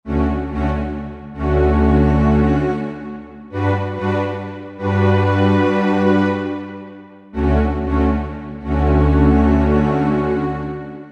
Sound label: Warm Strings, Roland JV-2080